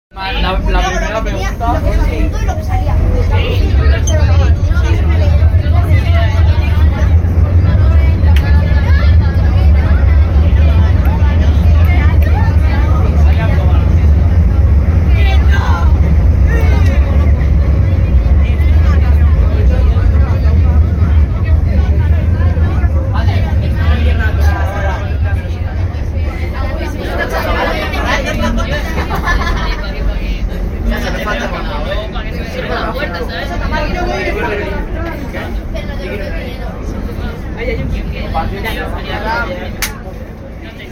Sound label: Barcelona metro